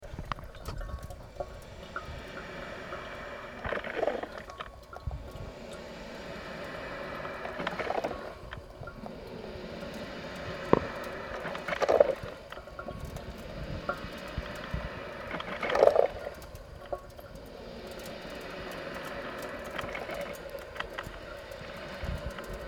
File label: coffee maker